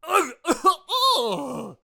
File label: Death Vocals